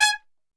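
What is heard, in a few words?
G#2 trumpet shot, Brass Super Section; Best Service; TRP SHOT; 90 s Sample CDs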